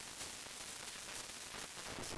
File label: noise; quantization